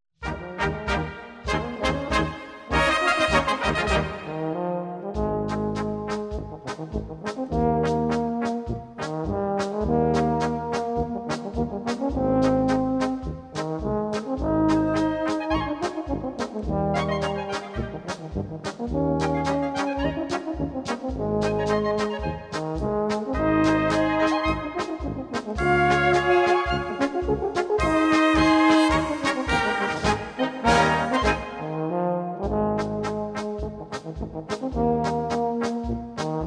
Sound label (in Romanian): Instrumentala